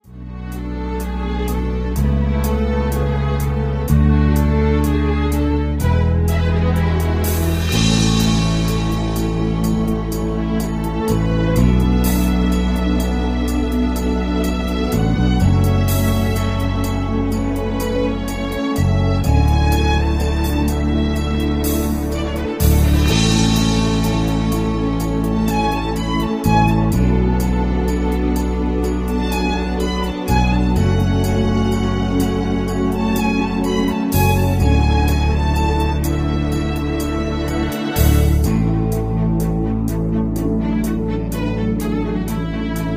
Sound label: rhythm